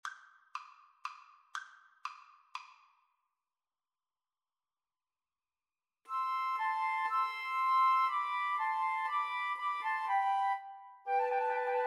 Spanish Dances flute trio

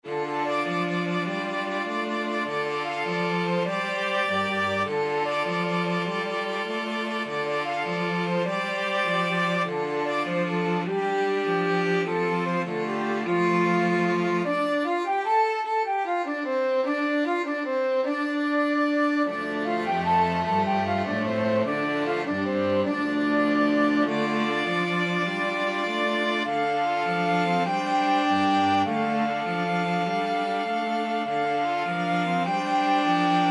flexible mixed ensemble, 4 players

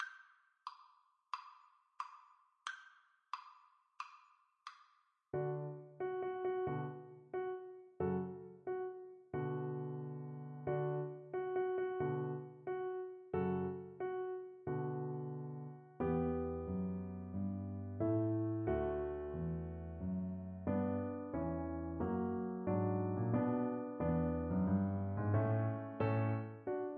nutcracker, Bassoon

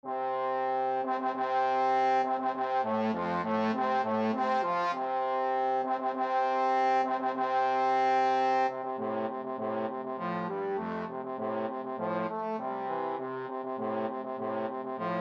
trombone duet

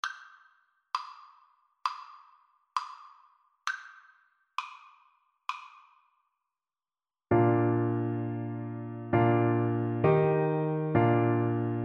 o little town, Tuba

